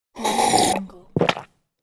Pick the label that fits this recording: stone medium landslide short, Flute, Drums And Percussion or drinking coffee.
drinking coffee